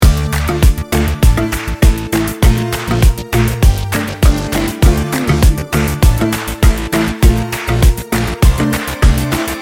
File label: friendly vibes loop